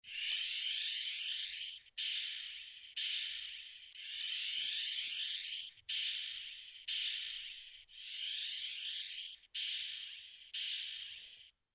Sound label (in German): laserschwert